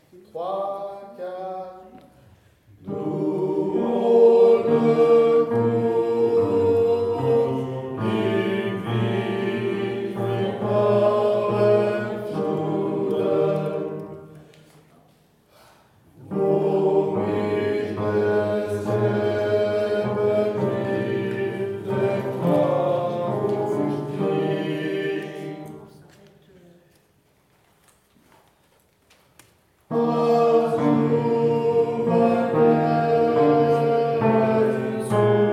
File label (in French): Tenor Basse